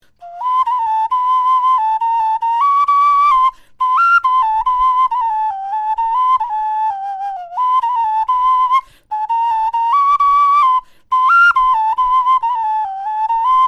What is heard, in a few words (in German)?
melodie, knochenfloeten